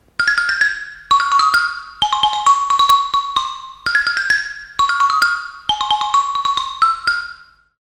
xilofono

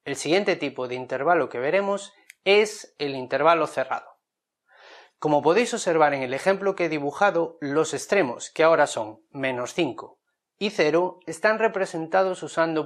intervalo cerrado

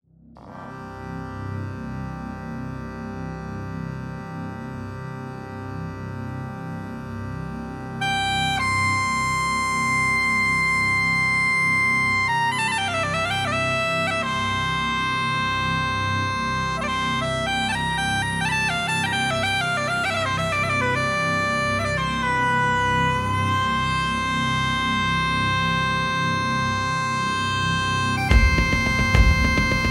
muñeira

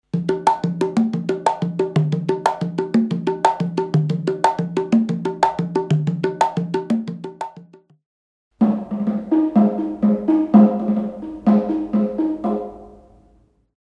congas y bongos

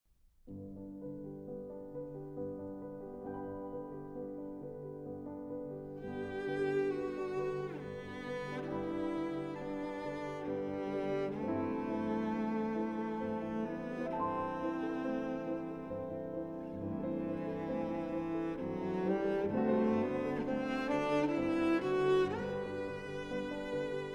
violonchelo; Cisne

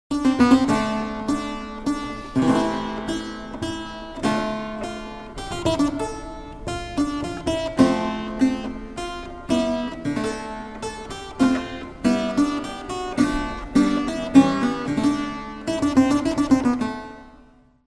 clavicordio

